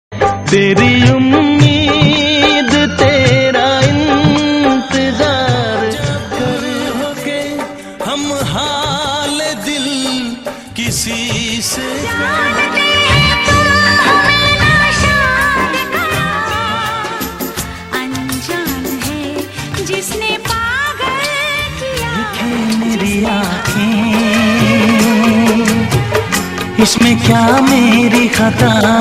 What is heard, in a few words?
Sad Song Mix